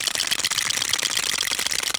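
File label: Piranha Teeth Loop; Mars Sortie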